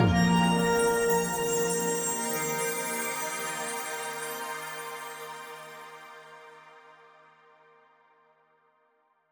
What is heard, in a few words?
Jingles PING; Semblance